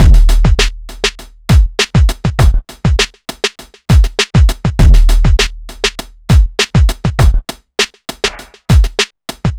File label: groove 100bpm